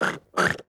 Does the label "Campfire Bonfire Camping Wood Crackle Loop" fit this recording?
No